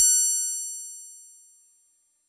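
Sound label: Fuzz Clav DX100 F#0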